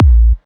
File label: Kicks; Vengeance Samples